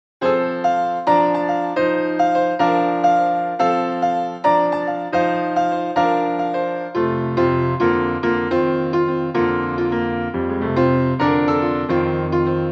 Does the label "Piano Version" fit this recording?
Yes